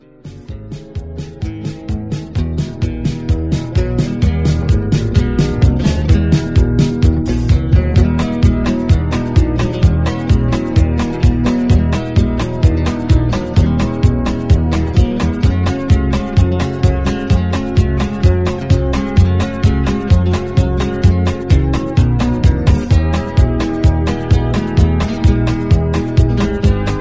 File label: Instrumental